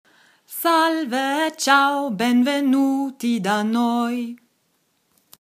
gruessdi italienisch gesungen

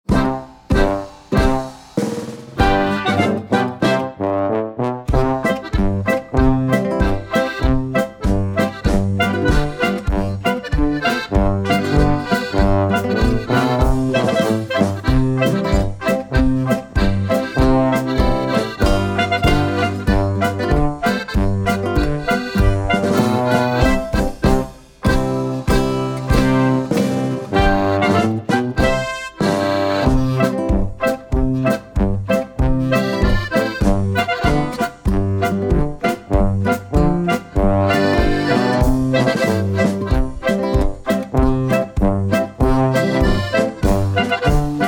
Kreuzpolka traditionell

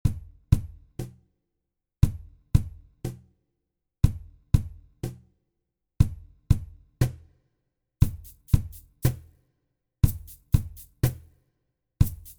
stadltur rock nur perc.

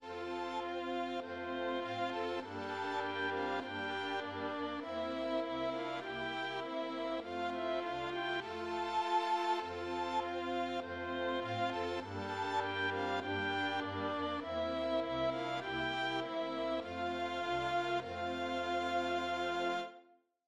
Streicher

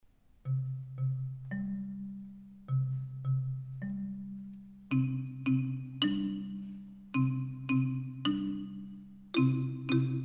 gruess di playback

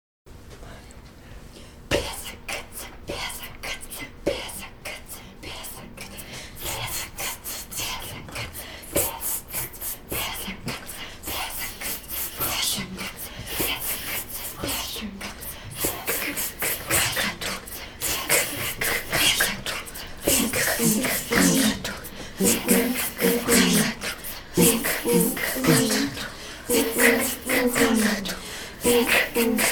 begleitpatterns; willkommen

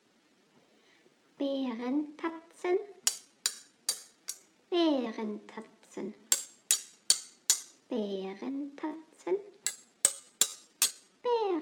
Bärentatzen, Hörbeispiele zum Üben, Rhythmus

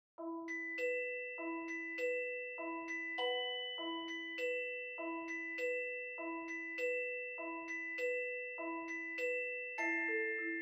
Begl Stabspiele; Die alte Moorhexe

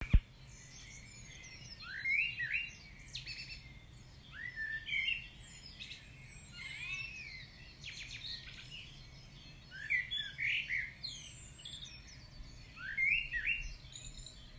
Morgenkonzert Singvoegel

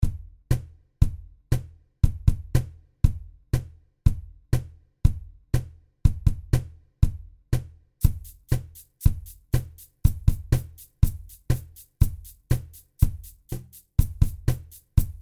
stadltur polka nur perc.

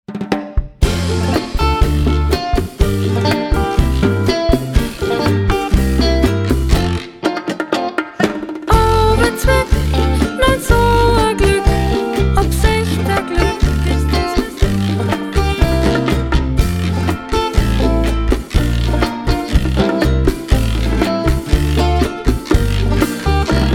Auweh zwick PB

